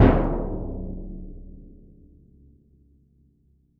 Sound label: BassDrum; 膜鳴楽器; 打奏; BassDrumの音の減衰